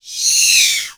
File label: Pterodactyl piercing screech